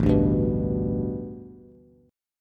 A 7th Suspended 2nd Suspended 4th Bass; A7sus2sus4 strummed

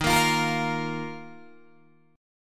D sharp Suspended 2nd D sharp, Guitar Open D; chord shape

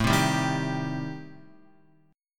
A-Minor 7th Flat 5th, Guitar, chord

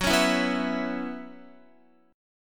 Gb 7th Suspended 4th Gb down Guitar; Gb7sus4 strummed; 4E,4A,5D,5G,5C,6F

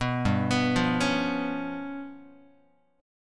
G 7th Flat 5th, Guitar; G7b5 arpeggiated

